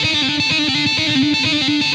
Electric Guitar, Loops; Guitars

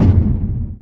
closing wall; theGoonies; Retro

